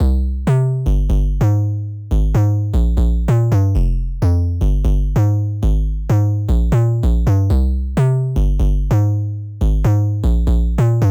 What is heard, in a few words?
128 BPM C Min Bass; House; Drop Loops; Cymatics; Halcyon